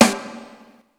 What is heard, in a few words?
Rimshoot; Giga Samples Collection; ELQ-DrumQit